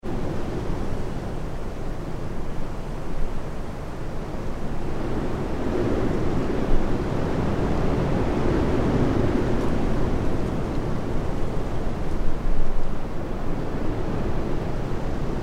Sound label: weather wind strong trees; Wind - Strong Stormy Wind Through Trees